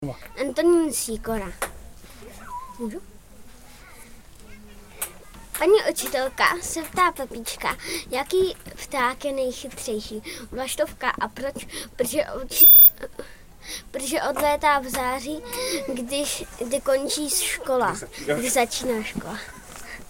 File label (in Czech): slavíci